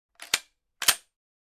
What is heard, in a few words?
Benelli M4 pump series